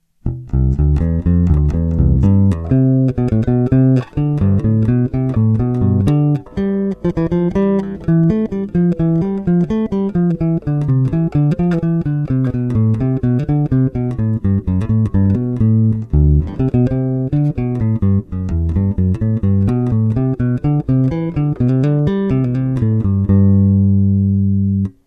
bass bach bridge

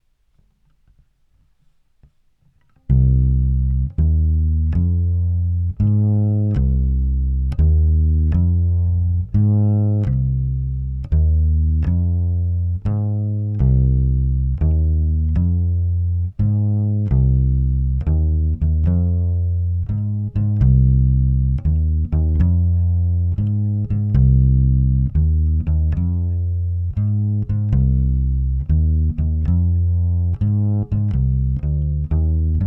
takamine; instrumente; takamine b10

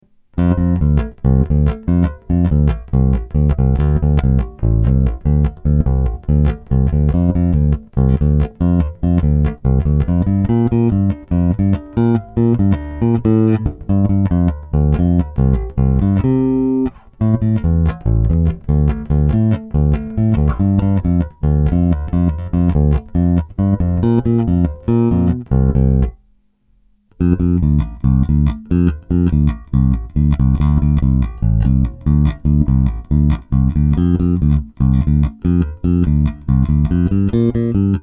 bb std 2 finger

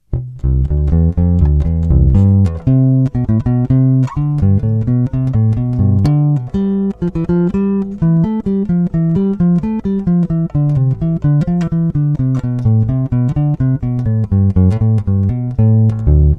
bass neck

